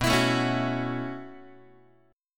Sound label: B 9th Suspended 4th, down, Guitar; B9sus4 strummed; 4A,4E,5A,5D,5G#,6C#; chord shape; JGuitar